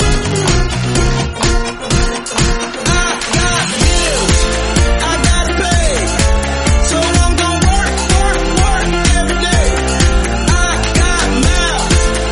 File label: REDRUM Snippet 126bpm F